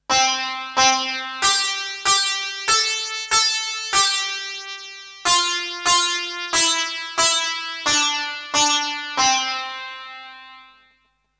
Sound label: sitar